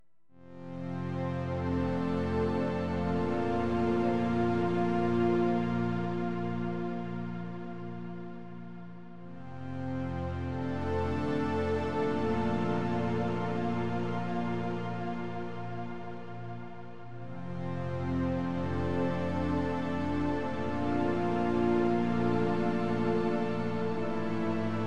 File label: Strings